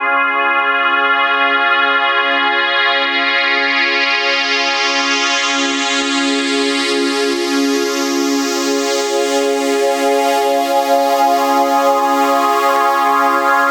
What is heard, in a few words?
NRG Pad